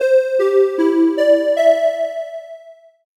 drynotes

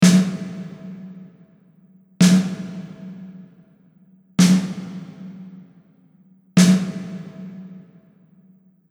Snare Convolution Reverb

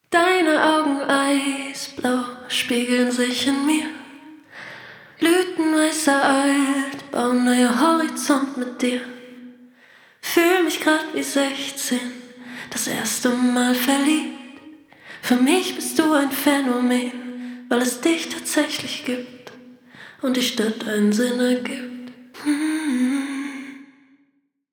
Vocal Reverb

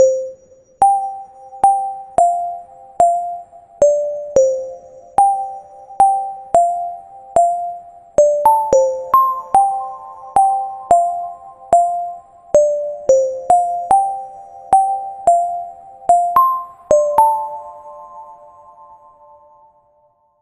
bell comp

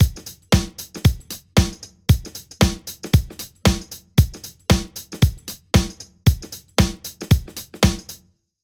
drum verb

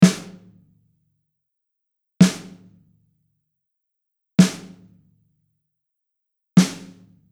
Snare Clean

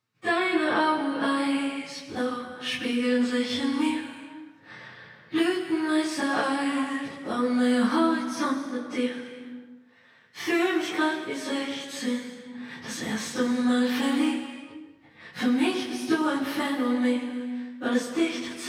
Reverb Only

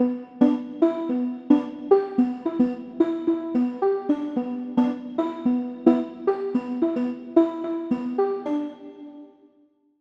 verb comp

